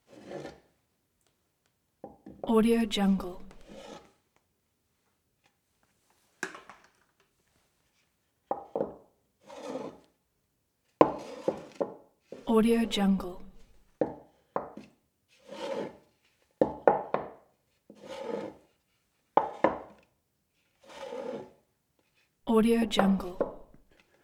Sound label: tray on off bar no drinks